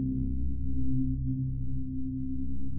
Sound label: hallway ambient; Dystopia